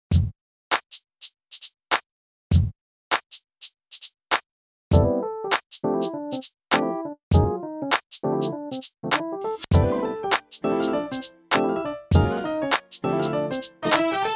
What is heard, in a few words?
drumless